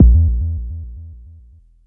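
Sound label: Mod bass drum, Jomox Airbase99, Drum Machines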